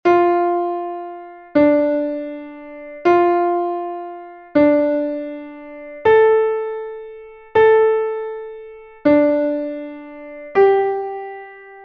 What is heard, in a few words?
8 notes plus g sound